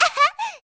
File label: Laugh; Medli